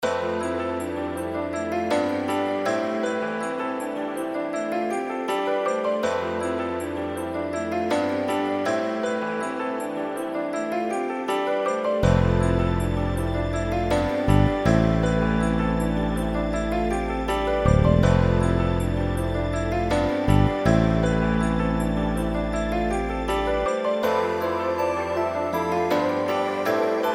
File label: 160 Am melodic pain